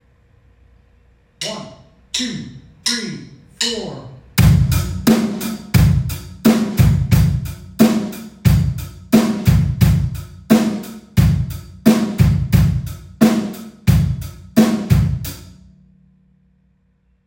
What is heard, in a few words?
eighth kick, drums